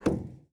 Aircrafts Cockpits Stick Roll Lim; DCS

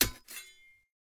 sword strike muted; Rust

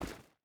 footstep rubber boots stones run; Rust

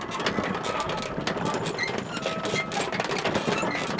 Artillery Run Loop; Civ4 beyond sword

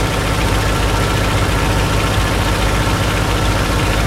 zero cockpit, engines; War Thunder

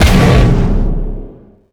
dash

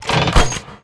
polycraft arms out

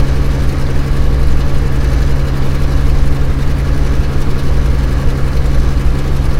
griffon cockpit l, engines; War Thunder

